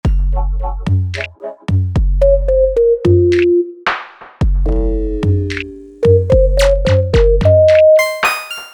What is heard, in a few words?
Midi Loop; Midi Playback Example; VRChat; worlds; MidiPlaybackExampleScene